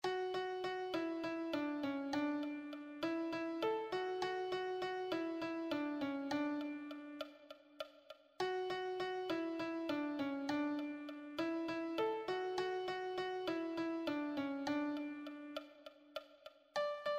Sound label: melody